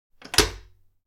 Steam lever machinery mechanical pull turn off

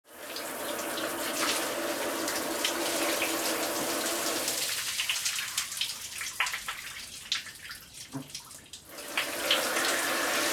Shower running bathroom distant perspective

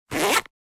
Coin pocket zipper wallet; foley; Wallet and Purse

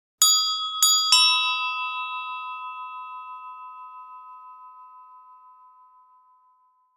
Medium sized handbells ringing together

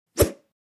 Arrow fly by whoosh

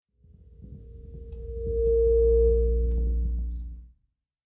Microphone sting comedy fail

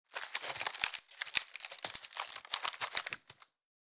Wicker basket creaking

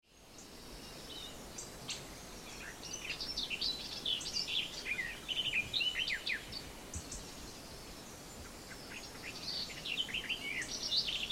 Suburban ambience light wind leaf and birds

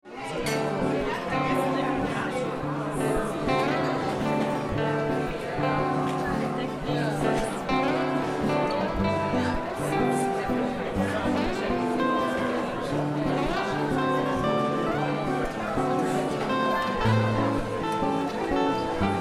Lounge bar cafe jazz live ambience

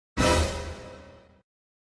Suspense hit accent

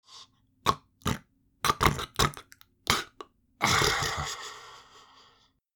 Multiple snores with apnea breaks

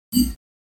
Digital futuristic confirm

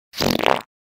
Wet fart

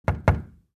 Knocking on door soft multiple

